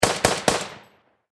Warfare Machine Gun .303 Caliber Vickers Triple Shot Distant Perspective